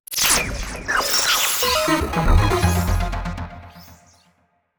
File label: ui legacy event start; Spacebase Startopia